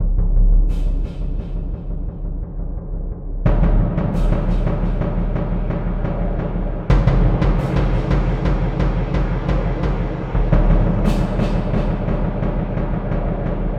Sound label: Lo Mid Perc